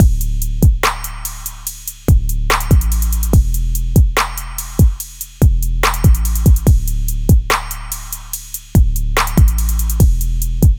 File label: Trap Bass